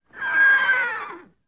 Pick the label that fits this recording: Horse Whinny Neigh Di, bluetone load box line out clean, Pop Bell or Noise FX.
Horse Whinny Neigh Di